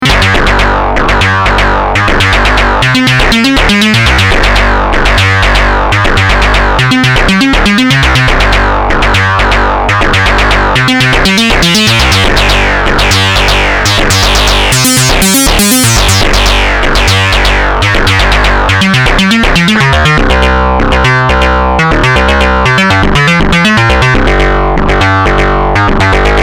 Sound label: microbrute Metalizer